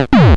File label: bullet bill; superbroswar